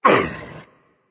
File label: grunt hit berserker; cuchulainn